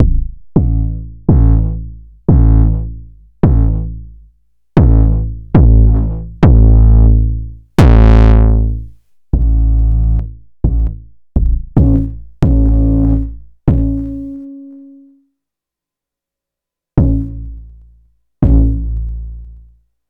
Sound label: Kick First Recs